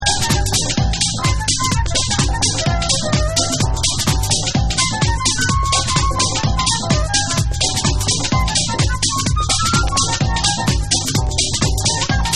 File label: Ambient Diva Mix